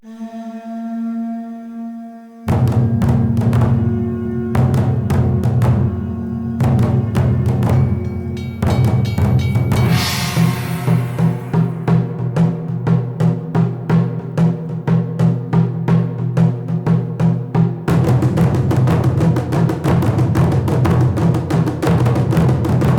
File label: Login Music; Login Screen; Tenth Anniversary